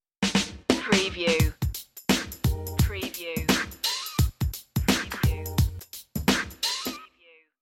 90s hip hop sitcom sting drums only

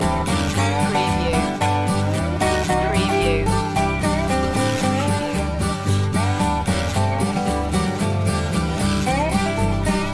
farmyard blues loop

